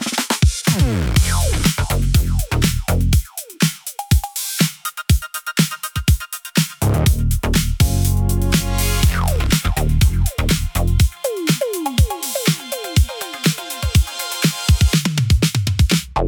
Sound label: rpg game over; tillys-quest